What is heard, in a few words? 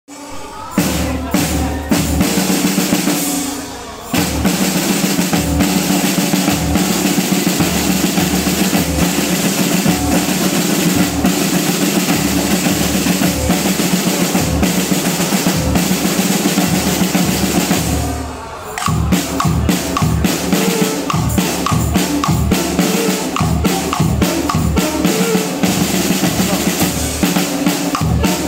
drum show; vajihi scout; instrumental